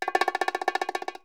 bongo roll; SDIF; ICMC2000

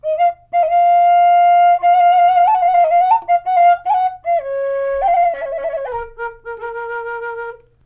suling phrase; SDIF; ICMC2000

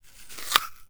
apple bite; SDIF; ICMC2000

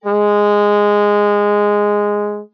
trump

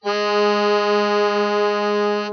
xfade, timbral interpolation

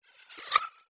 apple, resynthesis; SDIF; ICMC2000; Loris

